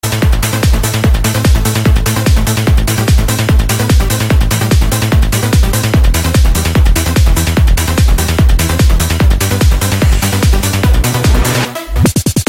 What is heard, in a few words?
Trance